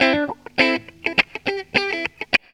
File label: GTR, GTR RIFFS, Funky Elements; Zero-G